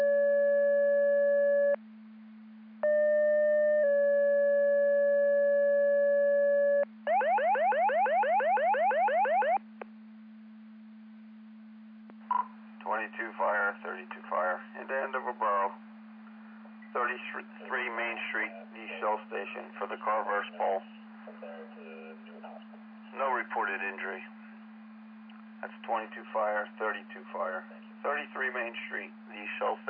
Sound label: Siren; Andover Twp Boro